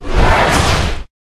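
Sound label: Dragon Skeleton attack mid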